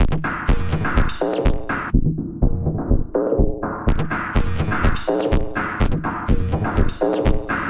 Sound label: 124 bpm funky machine gone bad, drum loops and breaks